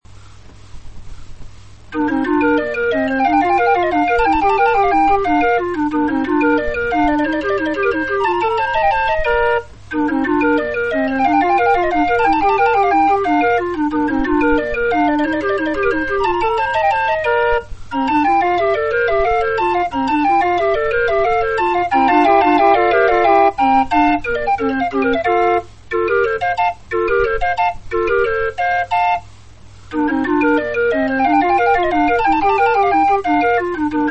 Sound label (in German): floetenuhr